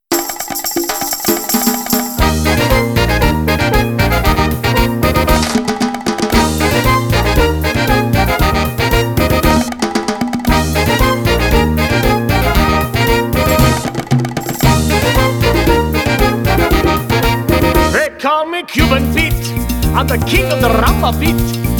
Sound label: Latin Band